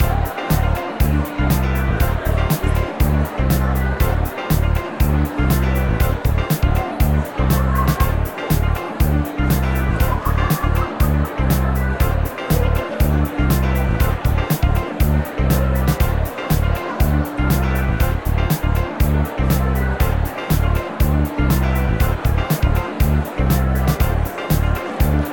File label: prom chatter loop; SnootGame